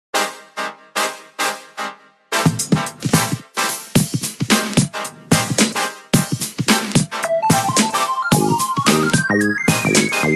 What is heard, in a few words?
Nokia N9500, Ringtone